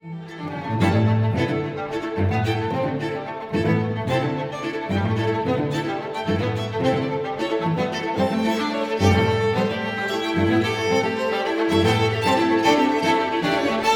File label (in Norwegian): Udelt takt